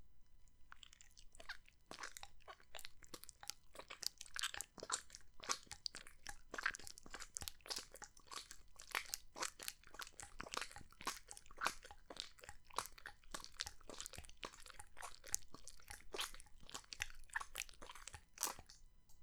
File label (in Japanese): エッチな装飾音